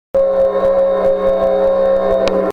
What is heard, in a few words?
Didgebox Voice; Steffen